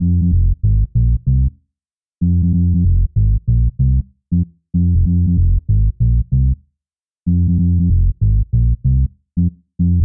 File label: Reggae